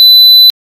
piezo fast repeat; digistuff

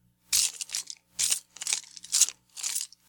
rattles; zombies; zombietest

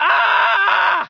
scream; warzone2100

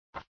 clock; horror

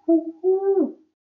pigeon cooing; petz

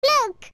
look; zelda

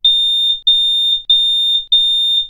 lockbeep error; realdoors

